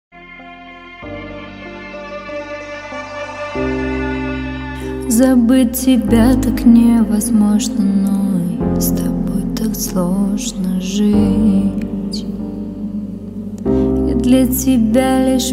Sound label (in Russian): zvuk gitary semistrunnoj; cvetocek7